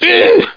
SW PAIN; SWARRIOR

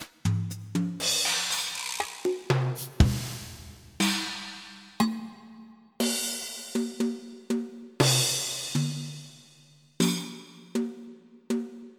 Brush; Onj's Soundfont

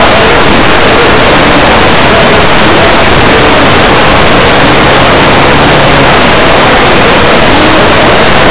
intercom

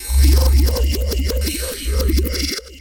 Abstract Loops; Cyclick Samples; Kit One

